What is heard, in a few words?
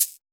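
clean shaker, R+B kits; CLEAN SHAKR; Drums In Your FACE!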